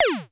KLONE DISCO ZAP, Klone Dual-Percussion-Synthesiser; KLONE PERC NW8